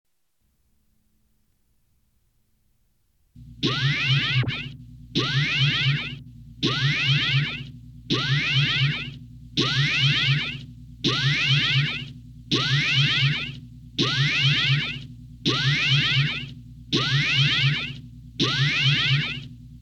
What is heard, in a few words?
forcefield stun